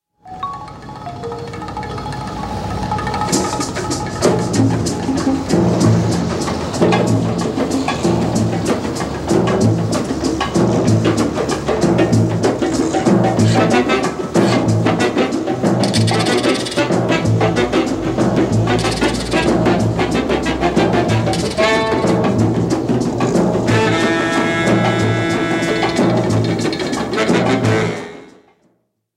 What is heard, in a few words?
saxophones percussion; suzuko